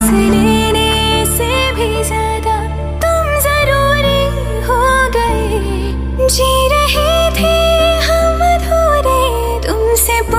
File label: dhadkan female